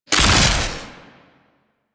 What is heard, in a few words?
Harvester Disassembler Shield; Warframe